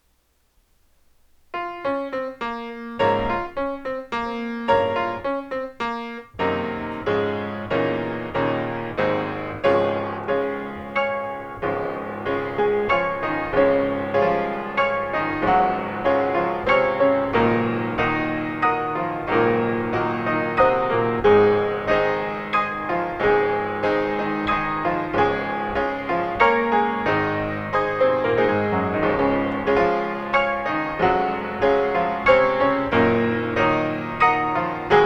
Piano Concerto; Tchaikovsky

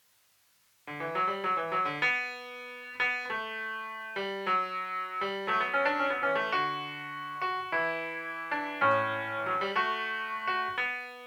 Fugue D Major; BWV850

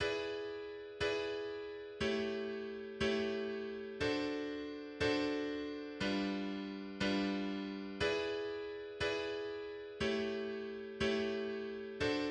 Jazz standard bridge

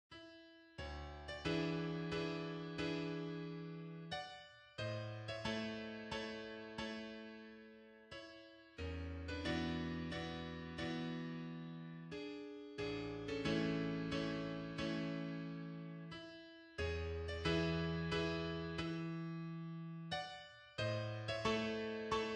Prelude; Chopin; Prelude 7

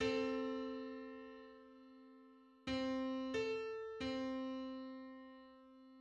Eight-hundred-sixty-seventh harmonic on C

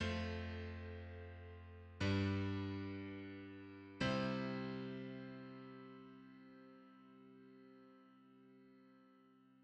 IV-V-I in C

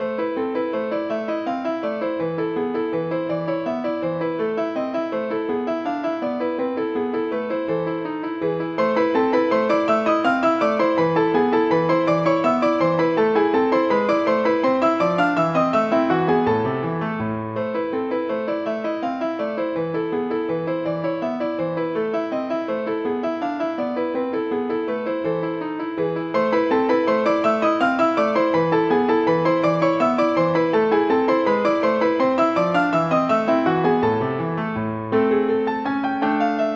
Piano Sonata No. 11, Variation 3

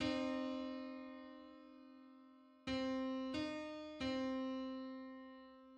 Two-hundred-ninety-ninth harmonic on C

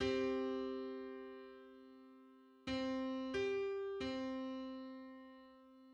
Three-hundred-eighty-fifth harmonic on C

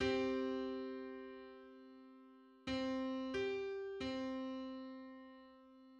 Hundred seventy first subharmonic on C

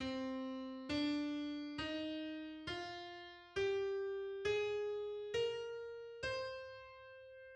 Aeolian mode C

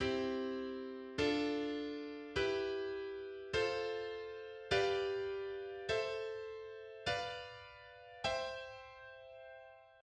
Harmonized scale

